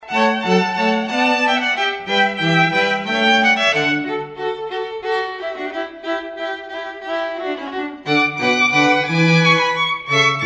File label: Hornpipe, quartet